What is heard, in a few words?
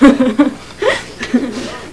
LAUGH